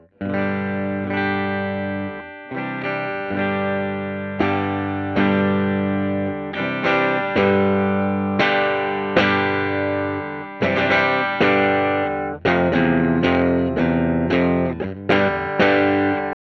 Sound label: light overdrive, amps; mamboo; booteek amps handmade by marshall heiser